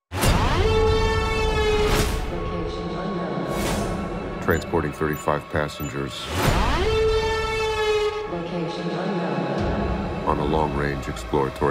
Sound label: Rhythmic Motif extended